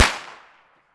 firecracker explosion echo reverb city concrete walls